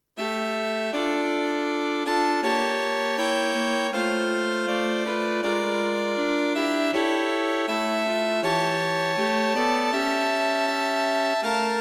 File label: String Quartet for Concert performance; Slow and thoughtful, this String quartet is full of tricky harmonies.